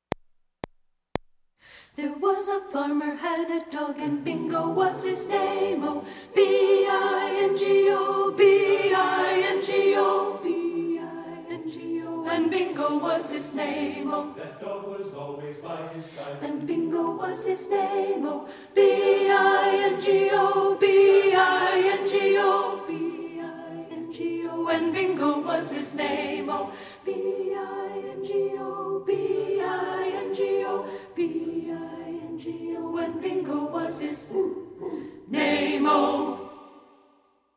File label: ALTO; satb